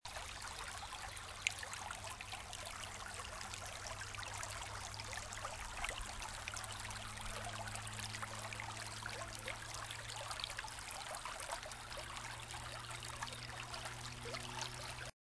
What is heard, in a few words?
sounds of nature; irishtown park